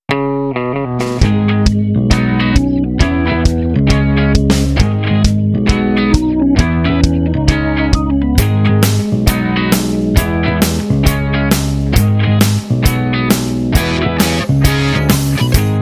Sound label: cool guitar